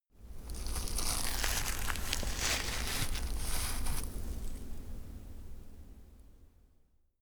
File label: clothing against branches; foerster